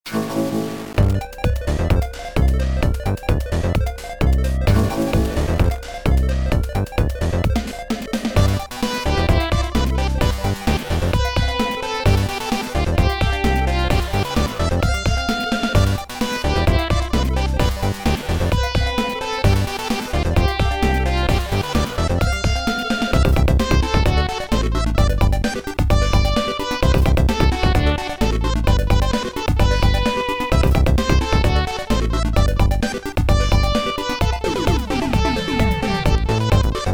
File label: Techno; Final Fantasy VI; SNES; 1994; Riding Chocobos; VGMTRANS; Sega Genesis